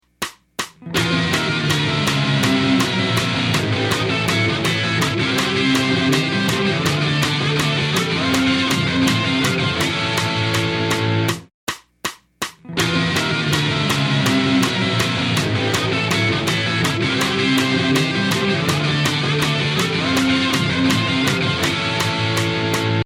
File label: Dual Guitar Example; pop rock metal guitar